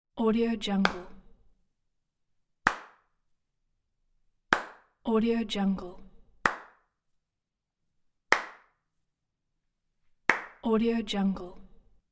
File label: hand claps; دست زدن تکی; human sounds